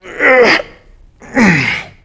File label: player climb efforts; Call of Duty Modern Warfare